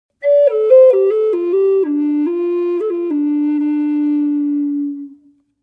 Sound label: d minor, flutes; hi vol